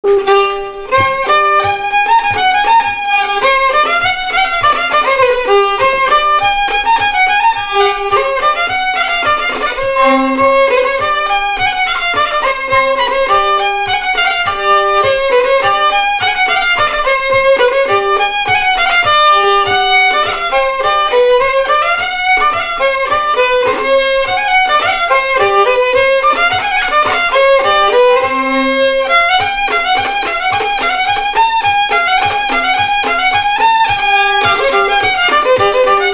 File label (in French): Marche de noce